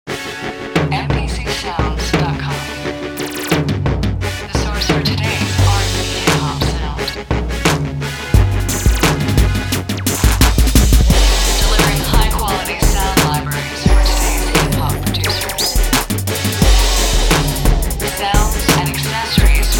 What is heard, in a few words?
Vintage Machinez